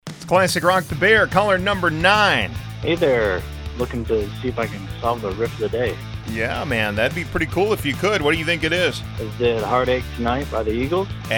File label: Riff